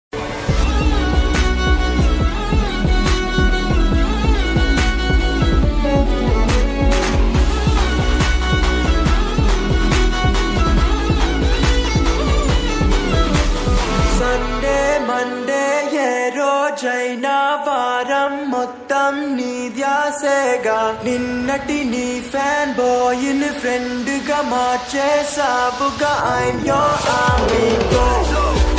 love bgm ringtone; a1 express; bgm king

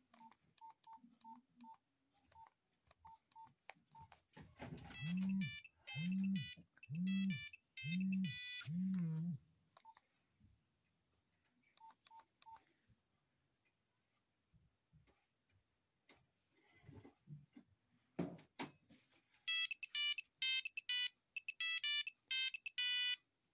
nokia ringtone; lumia

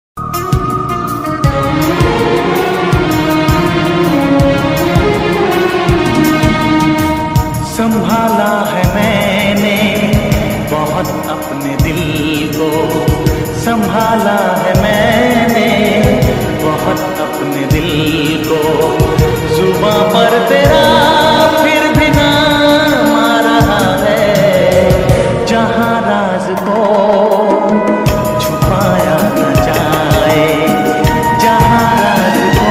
old bollywood song, 90s love song

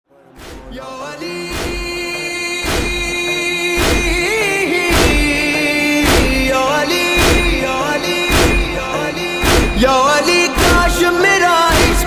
noha ringtone, shia

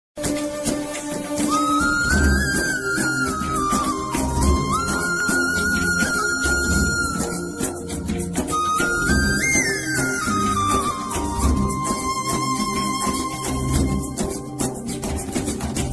flute bgm ringtone tamil; minnale; madhavan; reema sen

